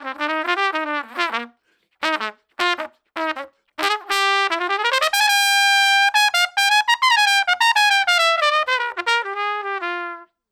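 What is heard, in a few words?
205 Trad Jazz Trumpet Solo, F major; Brass; Pro Tools Essential Loops; Digidesign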